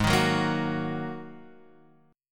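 A flat 7th Suspended 2nd A flat Guitar Open F, chord; Ab7sus2; x,3,3,1,1,1; strummed; chordshape; JGuitar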